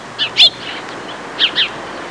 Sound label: Bluebirds chirping short